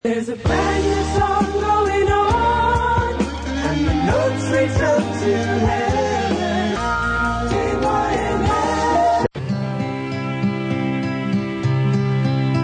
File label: Jingles; KYMS